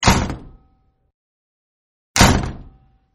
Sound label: Metal door close, Stadium Metallic Door Closing; Doors